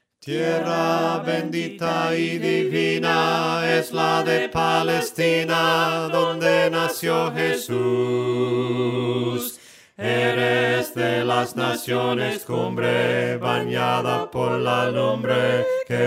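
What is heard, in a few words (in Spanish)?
Tierra de la Palestina melodia palabras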